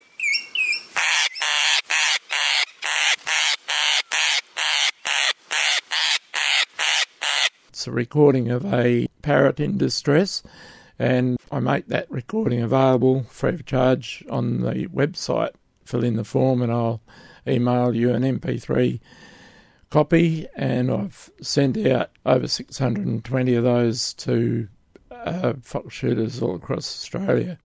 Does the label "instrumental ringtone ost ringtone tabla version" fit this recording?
No